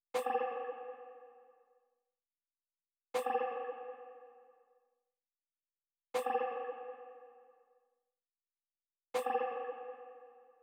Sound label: Drum Loop 160 BPM Percussion; Loop Stems; Cymatics; Vibes II Drum Loop Collection; Positive